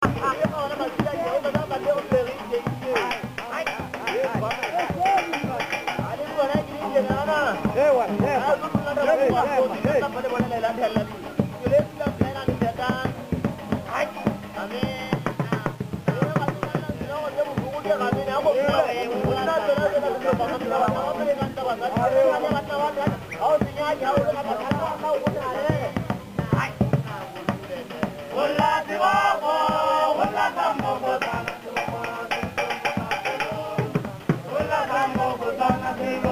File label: sound male band